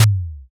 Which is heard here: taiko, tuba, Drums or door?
taiko